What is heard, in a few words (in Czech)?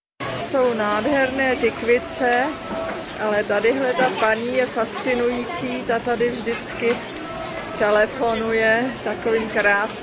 Quito trh tykve byliny; Video Ekvador